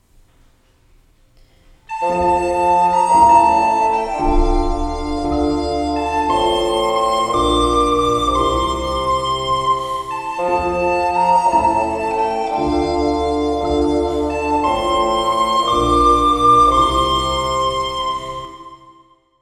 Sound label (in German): Fl Akkorde; Dans les jardins